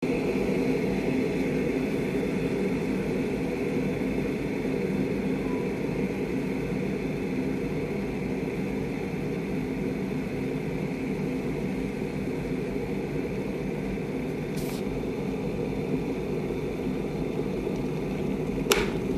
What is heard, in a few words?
Tea Kettle